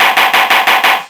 rapid gavel slam

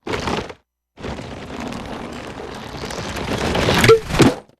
appear; cooper

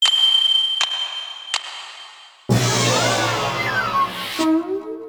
puzzle lose shuffle

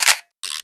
camera shot; scuttlebutt

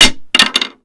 cane fall; bonny betty

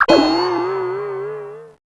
fail

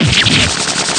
damage; laguarde

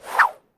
slash

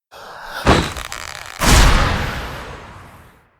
transform

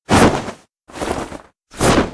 reveal; breakdown

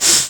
damage; buckler

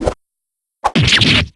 stab

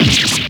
stab

